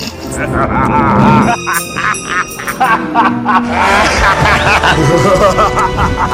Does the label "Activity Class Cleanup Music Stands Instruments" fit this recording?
No